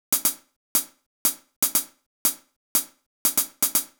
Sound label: Closed HH; hydrogen